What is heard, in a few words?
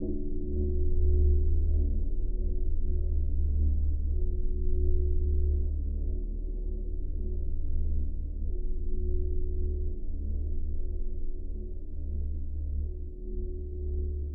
Deep Tone Drone; Atmos Waves